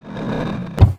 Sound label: Turning Billboard; 3D Sensations 3DEEP